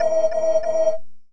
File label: ui quest spawn escort